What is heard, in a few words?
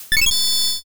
all clear